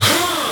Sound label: Vocal Oneshots; Vengeance Samples; Vocals